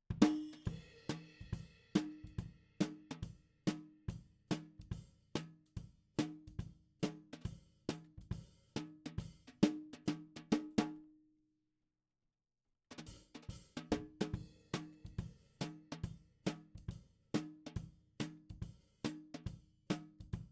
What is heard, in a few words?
SNR top